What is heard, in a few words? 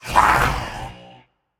grunt attack, voicepack; smite; jorm